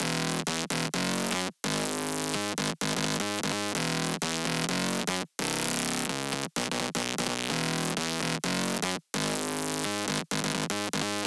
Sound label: 128 BPM G# Min Bass; Drop Loops; Loop Stems; Cymatics; Take Me Away